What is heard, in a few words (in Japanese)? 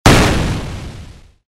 戦車砲 モノラル; 武器効果音